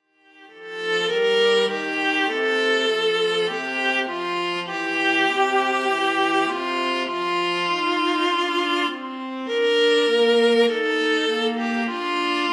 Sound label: fanfare